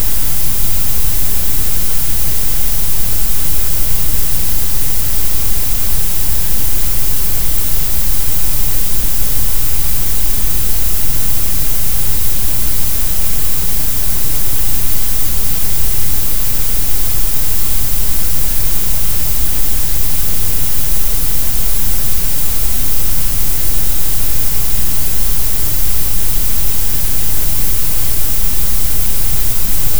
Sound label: Grey Noise